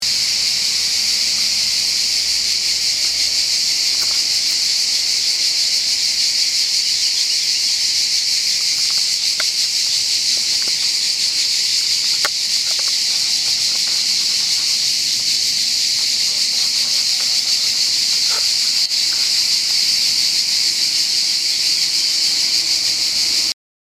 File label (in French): chant des cigales